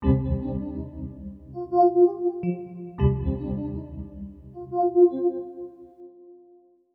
SYNTH D+B 160 C, Drum+Bass Keys; eJay

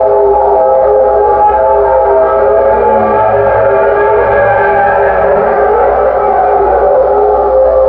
atmosphere; PC Gamer IT CD 41; Daikatana

